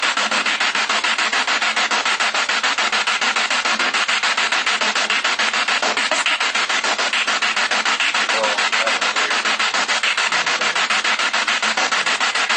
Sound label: Kitchen Spirit Box